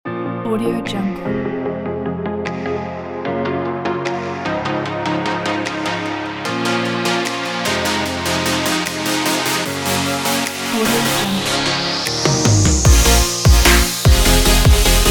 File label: future bass